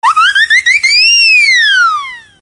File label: high pitch whistle notification sound; galaxy